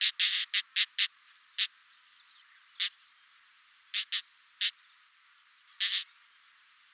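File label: northern wheatear voice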